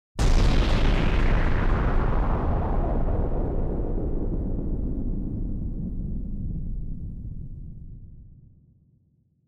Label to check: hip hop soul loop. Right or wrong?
Wrong